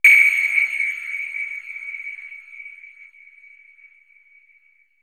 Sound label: synth bell; AKAI S6000 CD-ROM - Volume 5